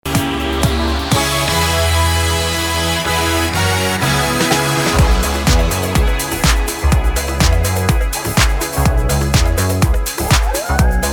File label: Club Party; Halloween